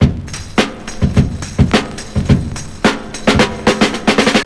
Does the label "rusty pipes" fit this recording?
No